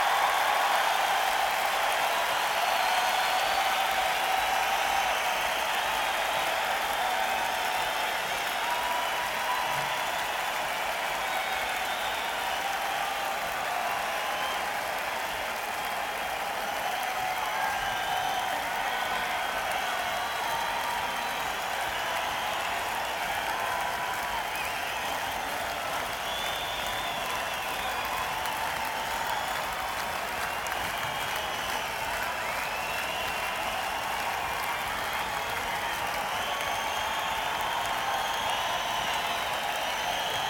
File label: Encore Break; akg451; neumann u87